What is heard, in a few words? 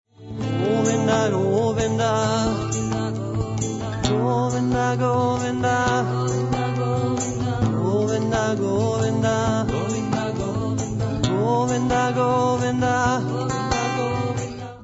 Govinda Jaya, Guitar